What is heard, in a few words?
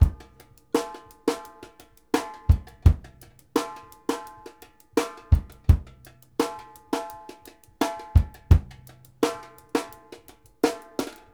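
straight, drums; Sampleheads; New York City Drumworks VOL-1; SP BLASTX 84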